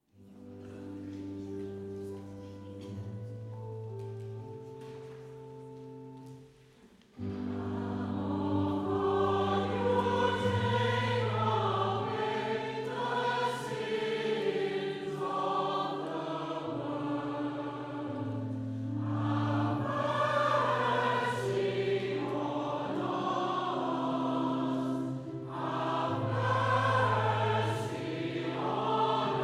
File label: Agnus old St Andrew